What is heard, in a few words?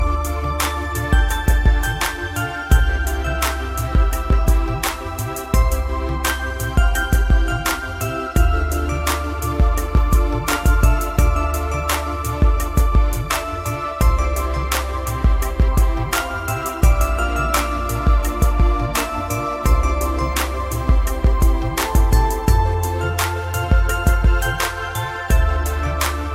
valentines music, reggae